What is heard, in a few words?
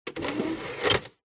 household dvd player disc tray close